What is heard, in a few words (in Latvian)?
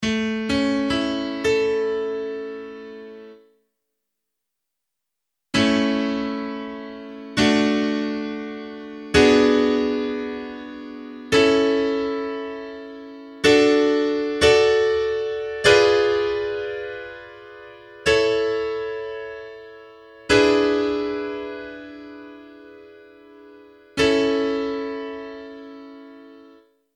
akordu sec; AKORDU SECĪBA